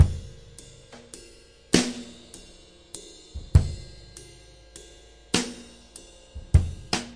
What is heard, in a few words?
six eight Loop, Six-Eight Loops 100 to 105 bpm, DRUM LOOPS and BREAKS